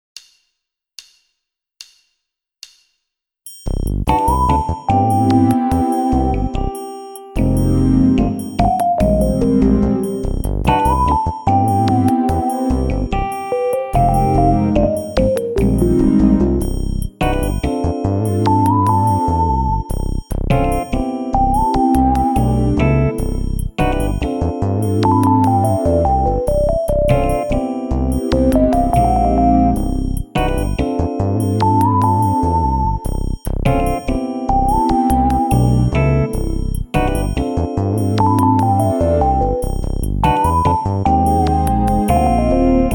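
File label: Backing contemporary R&B; V-Drums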